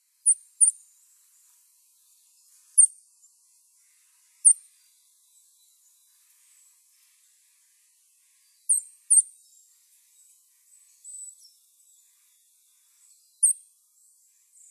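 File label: Eurasian Nuthatch chick; kyoto; koe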